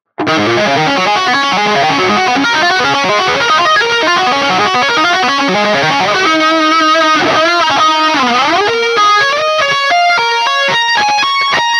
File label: Lick